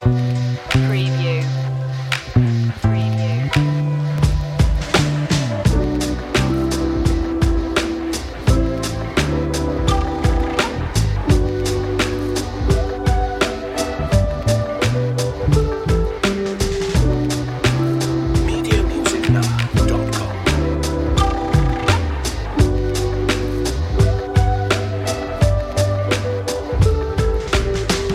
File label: sunny vibe